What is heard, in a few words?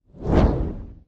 Fire Ball Catapulted